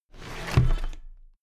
drawer cabinet paper close heavy halfway

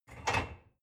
dishwasher cup grab soft

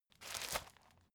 folder file jacket turn short

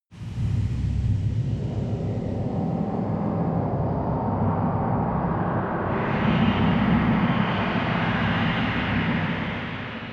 noise stinger

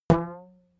rubber band pluck long

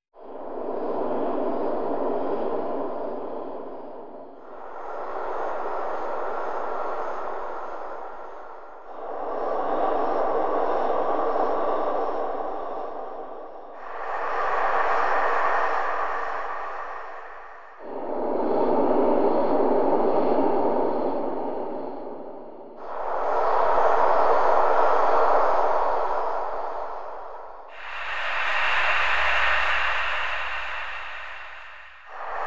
noisy ambient swells

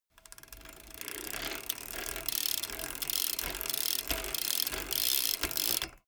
bmx bike pedal accelerate

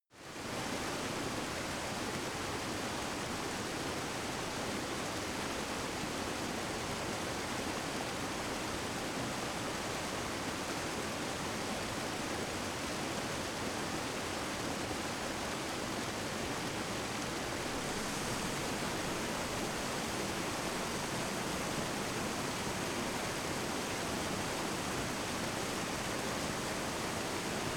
stream rush close

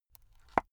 watch case small close soft

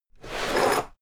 drawer cabinet equipment open heavy halfway

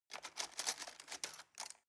cutlery plastic drawer rattle

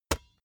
basketball single hand catch

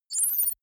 confirm micro click

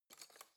cutlery spoon drawer grab

